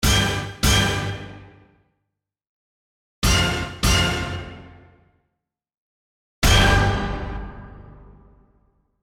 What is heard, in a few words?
Orch Hit; La Nouba